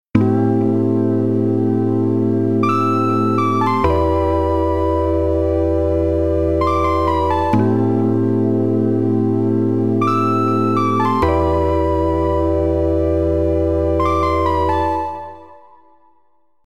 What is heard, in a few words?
KEYS